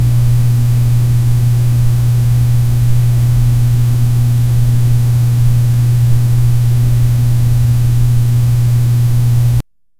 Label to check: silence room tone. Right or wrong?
Right